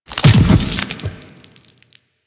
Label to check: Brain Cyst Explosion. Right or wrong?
Right